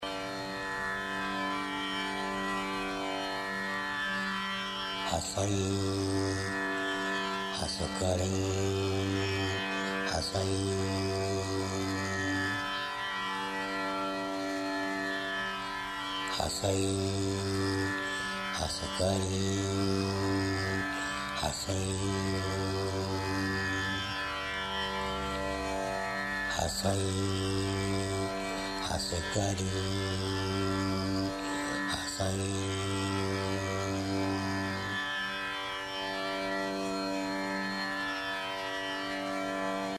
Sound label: tripura bhairavi mantra; av yoga; mantry